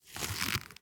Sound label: bow back; smite; medusa